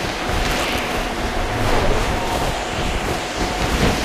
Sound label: grappling hook wind; tf